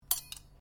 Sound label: cutlery knife pick up, Household cutlery; Kitchen